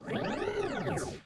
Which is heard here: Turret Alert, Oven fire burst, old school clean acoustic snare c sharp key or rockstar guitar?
Turret Alert